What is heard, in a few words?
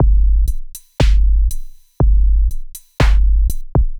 Lectrohaus, Loops